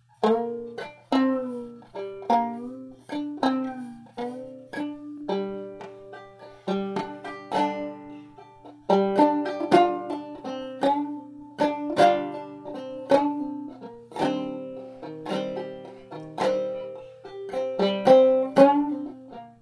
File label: Dark Matter Tango